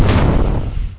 IMPACT; homm2; FALLEN

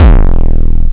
kick

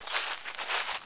CRUNCH; homm2

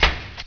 CLANG; homm2

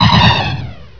SHOT; homm2; FALLEN

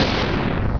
SHOT; homm2; FALLEN

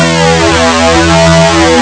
lead

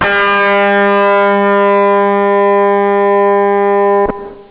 guitar

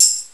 hihat

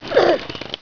SPLAT; homm2

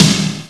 crash

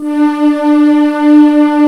flute

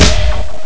snare

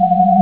wind

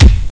kick

